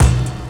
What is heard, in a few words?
Kick One Shot, Drums